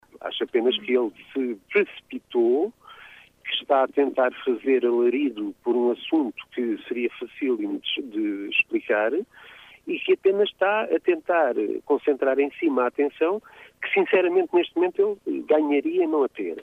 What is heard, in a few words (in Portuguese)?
alarido